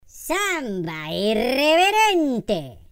samba irreverente

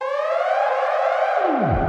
sirene

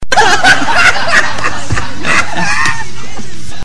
risada

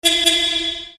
ringtone mensagem de texto

